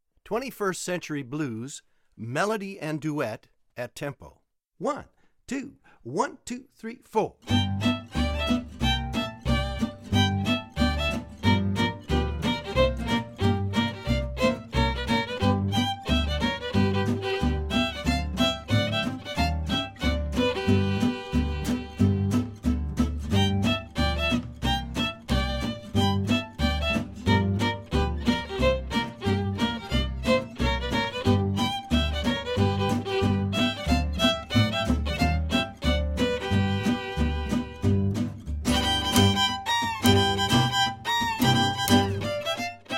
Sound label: 21st Century mel duet reg, Fiddle Swing; Jeremy Cohen's Make Your Fiddle Swing! Part I